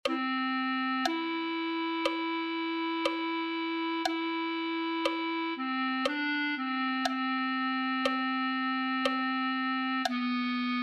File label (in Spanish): clarinete